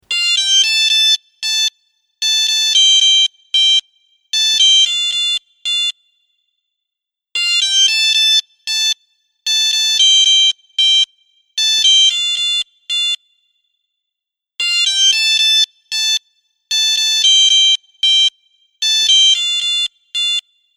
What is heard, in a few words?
ringtone